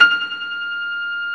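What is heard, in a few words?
reverse F5, piano; AKAI S-Series CD-ROM Sound Library VOL-2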